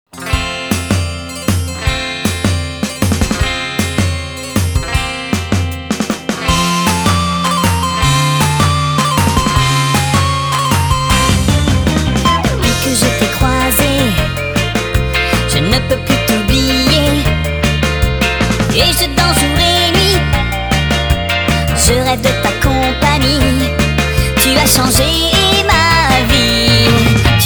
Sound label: French, Valse du rock; Rhythm Tengoku Gold; Frog Hop